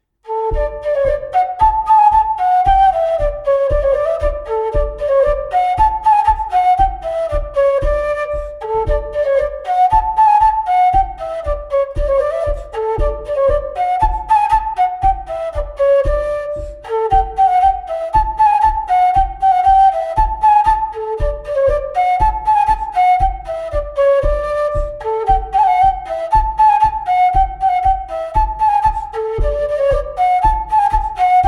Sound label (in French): Bourree; Recueil De Raoulx